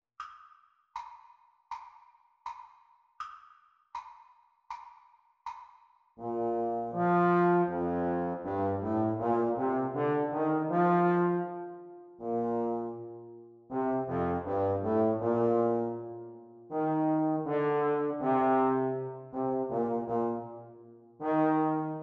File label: Playalong for Baritone Euphonium Practice Speed